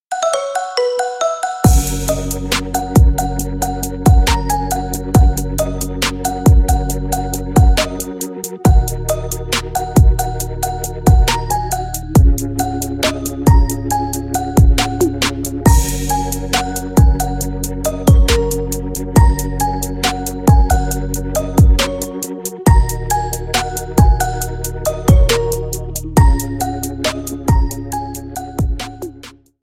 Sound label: marimba remix